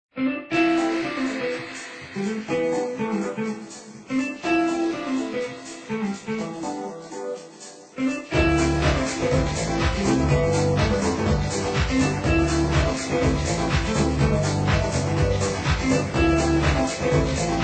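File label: Music Ambient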